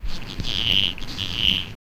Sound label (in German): dampflock pfeiffen; steam engine